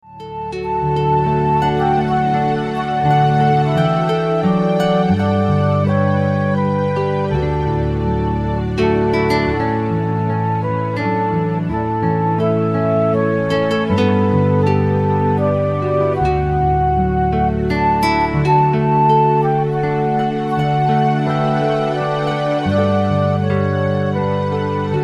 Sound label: Instrumental Away In A Manger; Christmas